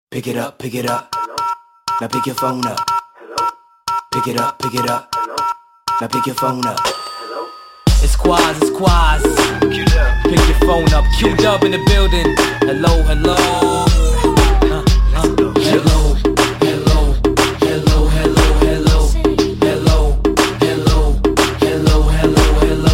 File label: Hello Hip Hop